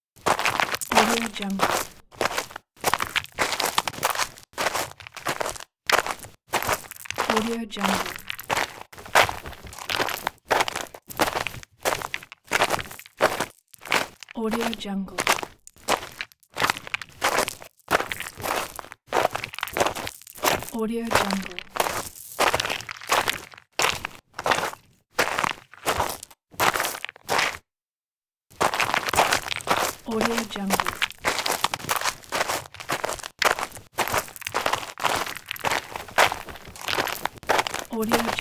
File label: walking on gravel